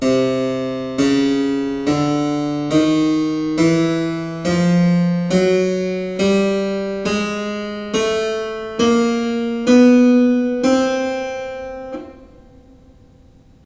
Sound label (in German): gleichstufig chromatik